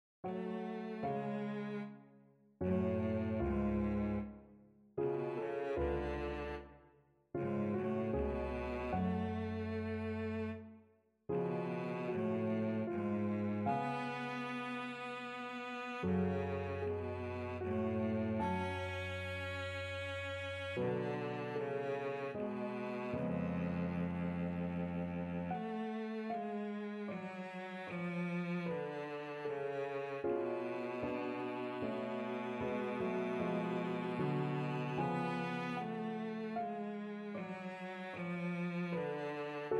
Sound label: cello